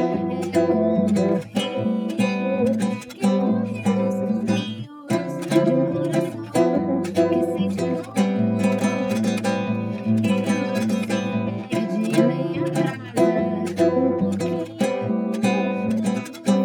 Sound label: guitar